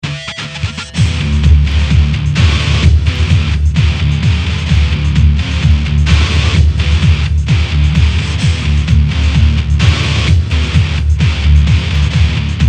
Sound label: rock